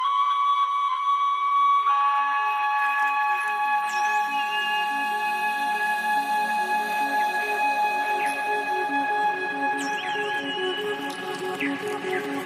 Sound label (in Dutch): fluit